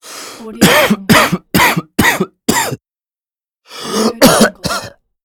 the bad human cough

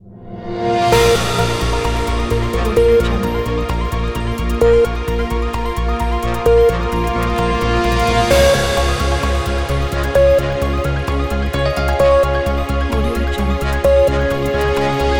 breaking news countdown

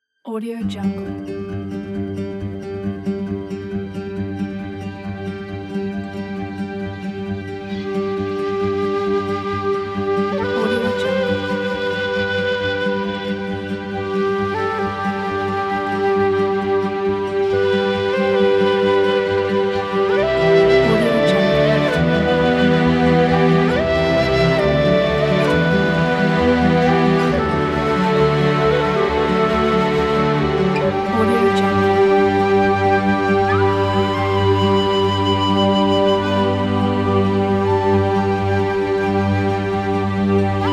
romantic flute and orchestra

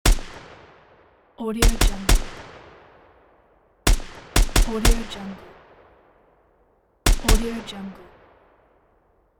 multiple gun shots

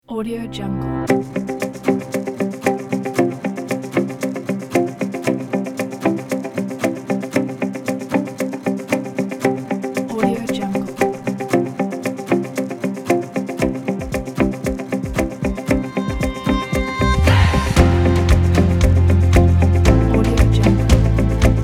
inspiring uplifting upbeat corporate; bluewhalemusic

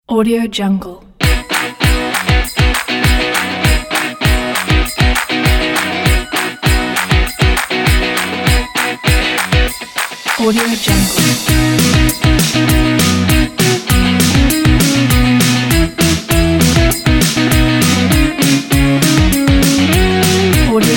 jingle bells

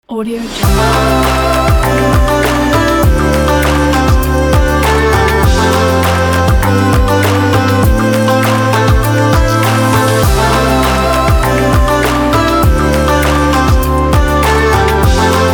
chinese asian logo

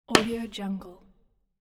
serving tennis ball

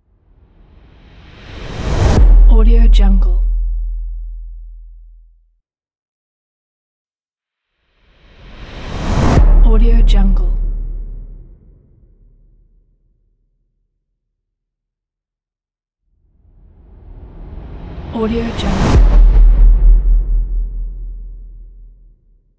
transition whoosh sound effect

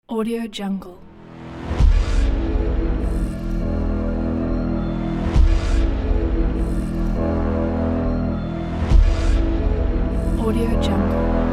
action intro trailer

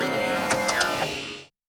scifi device

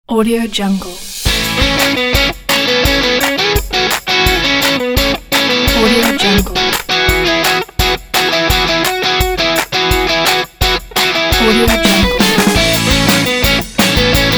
the indie rock

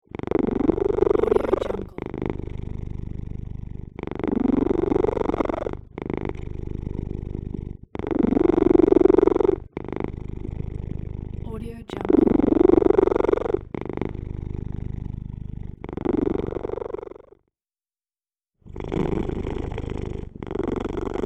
relaxed cat purr

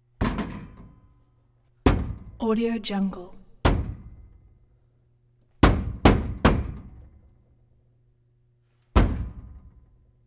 vending machine hitting with fist

